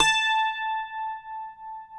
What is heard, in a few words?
CUATRO A 5, Guitars; Spectrasonics; Hans Zimmer Guitars VOL-2